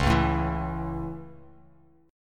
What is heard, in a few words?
Db Minor Sharp 5th Db Bass Standard; strummed; Dbm#5; JGuitar